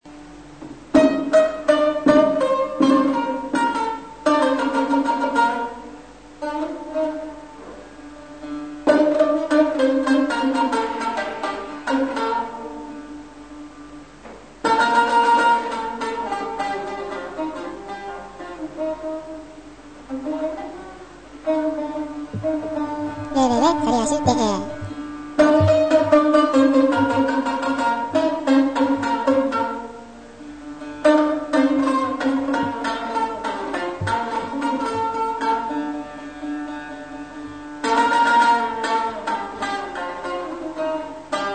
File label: saz